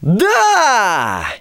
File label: Emote Cheer; the princess and the frog; Twitch Skijump